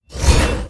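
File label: circle attack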